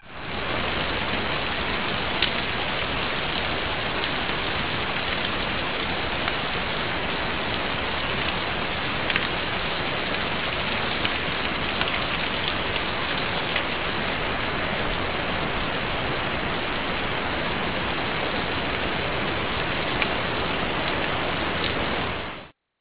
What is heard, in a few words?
hail; Sensorium; kawasaki